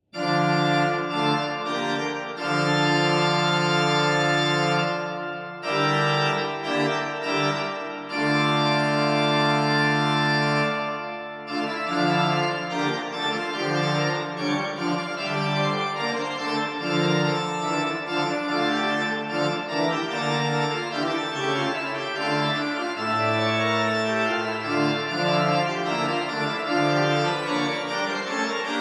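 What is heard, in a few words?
postlude duke street, organist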